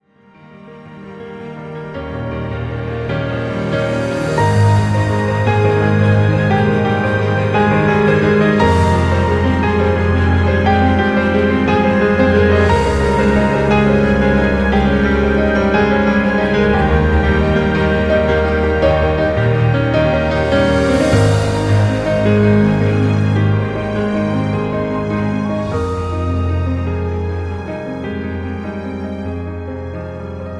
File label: Orchestrated